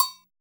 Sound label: POP agogo HI; POP-ROCK2